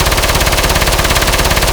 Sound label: airboat gun loop, weapons; hl2dm